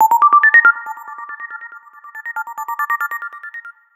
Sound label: Lumex, ringtones, HTC One M9